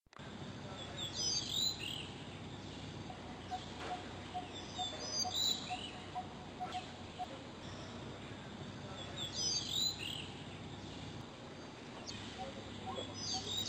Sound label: Blue Rock Thrush; Amar